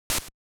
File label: footstep; Jay The Doggo